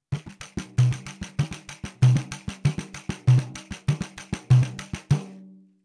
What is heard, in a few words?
Surdo